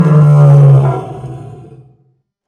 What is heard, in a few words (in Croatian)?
lav, animals